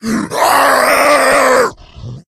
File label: rage, infected, male